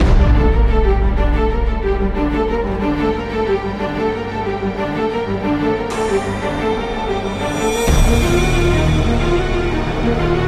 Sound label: GuitarMusic